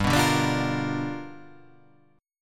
G 7th Sharp 9th G chord

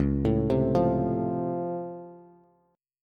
Db Augmented Major 7th, down, Bass; arpeggiated; JGuitar

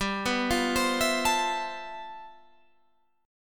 G 13th G, chord

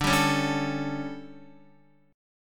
D Major 7th Suspended 4th, Guitar; DM7sus4; strummed; JGuitar

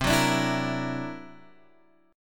C 9th Flat 5th C down Guitar Drop D

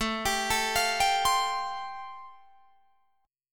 A-Minor 7th Sharp 5th, Guitar Drop D, chord

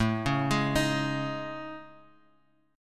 A Major Sharp 11th, Bass Standard

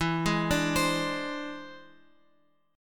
E Augmented 7th E; E+7 Chord {X 7 6 7 X 8} arpeggiated

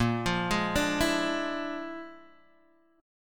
Bb-7th Sharp 11th, Bb7#11 chord; Bb7#11 arpeggiated

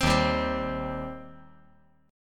D sharp 6th, D sharp, down; strummed; JGuitar

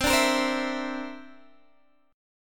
D 7th Suspended 2nd Sharp 5th C

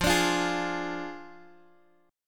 F Minor 6th F down Guitar Open G

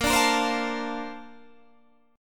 A sharp Major 9th, Guitar Drop D

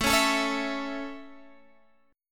A Major, Guitar, Standard; strummed

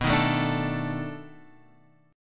B 7th Sharp 9th, down, Guitar, Open F; strummed

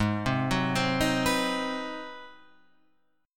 Ab 7th Sharp 9th down Guitar Open F; arpeggiated; JGuitar

